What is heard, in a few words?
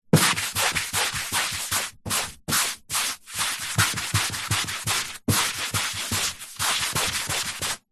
office eraser rub out chalk on chalkboard; zapsplat